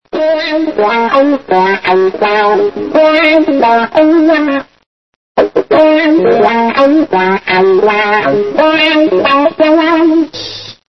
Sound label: riff